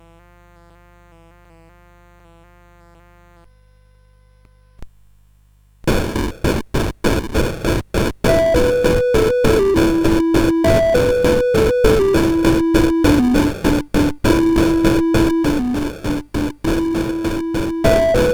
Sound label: Indian Drum, CSG8580R5